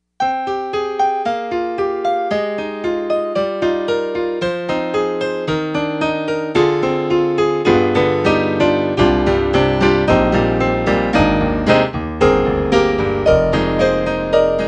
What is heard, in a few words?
YAMAHA TG55 Rock Piano